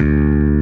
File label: bass